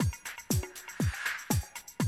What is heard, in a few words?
120, Classic Drum Loops